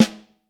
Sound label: Vintage Snare Drum; 5X14; Q Up Arts; Johnny.C's Vintage Snare Drum